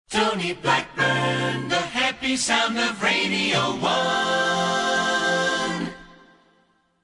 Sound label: happy sound jingle